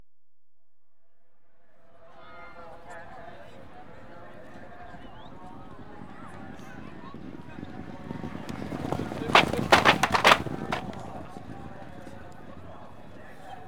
Racing, National Hunt, Horse Pass, Equestrian Events; BBC Sound Effects Library